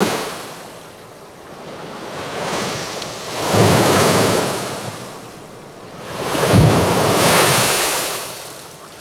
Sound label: gentle ocean waves crashing on rocks; water